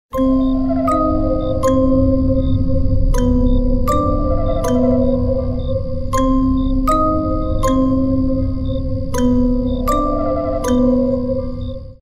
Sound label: Creepy music box